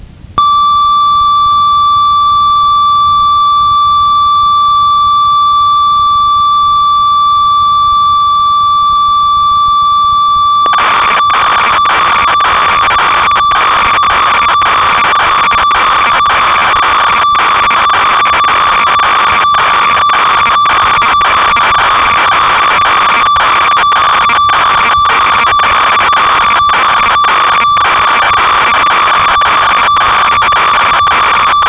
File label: ob6, synth